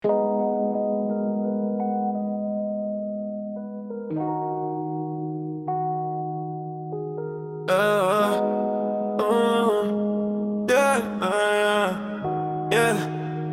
vibe music chill trap session; exhalando o stress 420

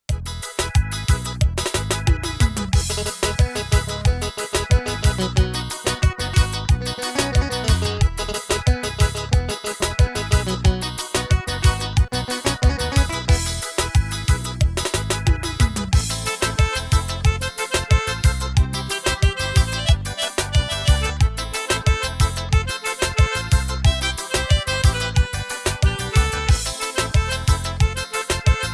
ritmos korg pa500

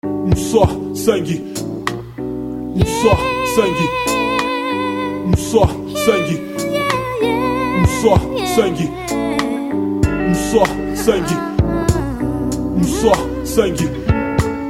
beat

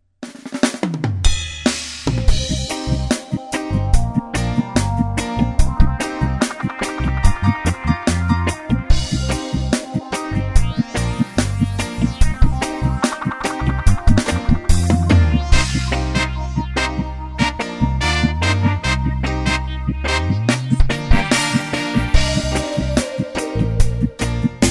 instrumental dub

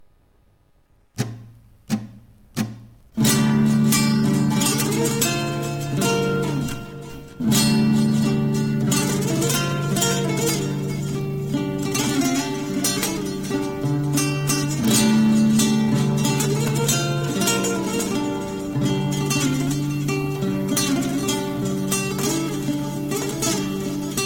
acoustic

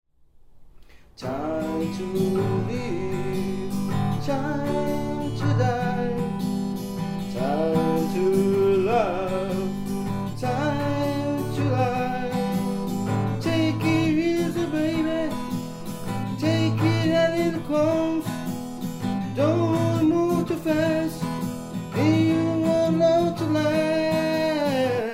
acoustic cover

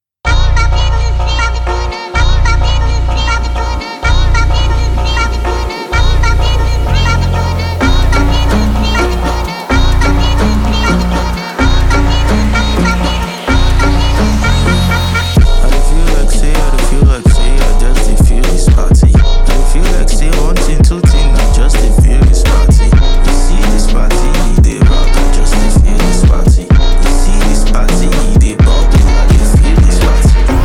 brazilian phonk party

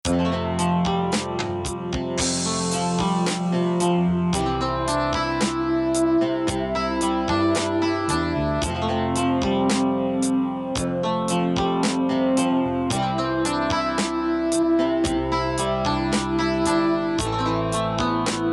instrumental

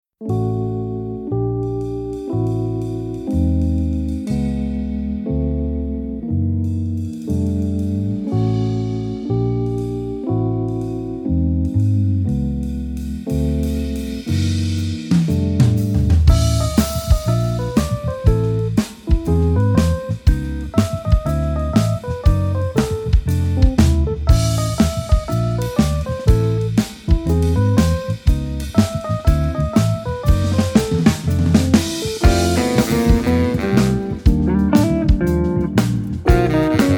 Blues

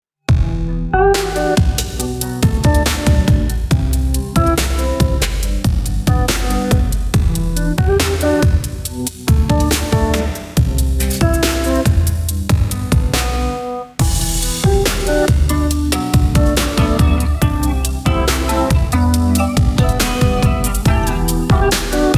instrumental

